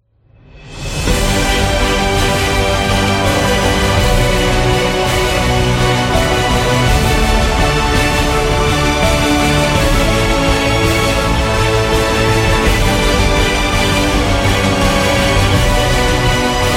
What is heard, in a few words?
Epic Trailer